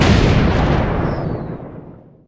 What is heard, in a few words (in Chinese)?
Dynamite explo, 炸药爆炸音效; 攻击音效 explo; 爆破麦克; 绿野世界; 荒野乱斗; 爆破麦克会向敌人投掷炸弹造成范围伤害